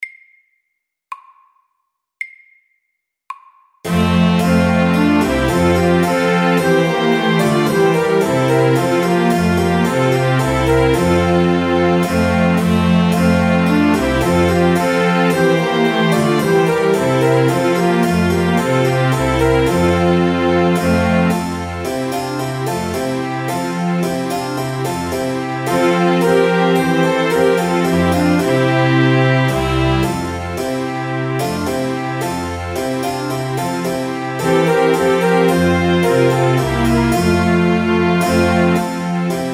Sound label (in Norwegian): Trumpet tune SAKTE